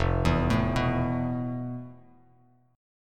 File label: F Major 7th Flat 5th, Bass Standard; arpeggiated; JGuitar